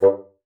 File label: bassoon, G2; Xsample VOL-6